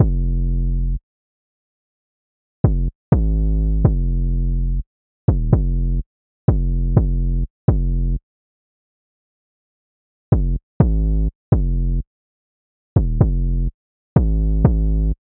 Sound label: 808 loop 125 Fm; Slate Digital BASSHOLE Sample Pack